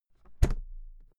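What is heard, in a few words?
briefcase close, Büroarbeit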